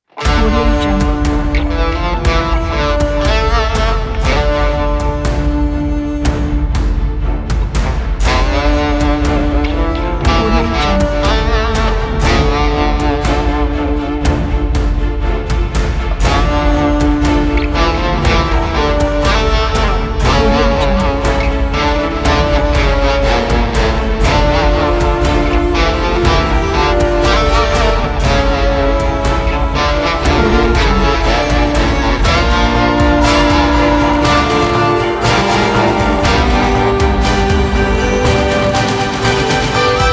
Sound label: dramatic spaghetti western classic intro